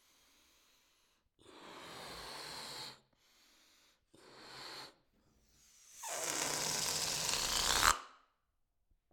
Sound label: Blowing Up A Balloon